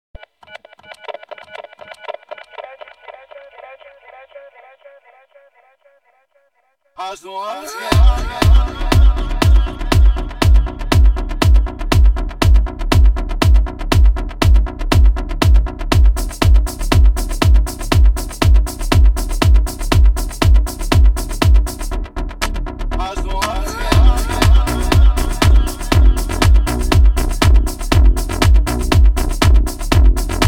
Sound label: Laser Clap